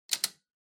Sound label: Soroban Reset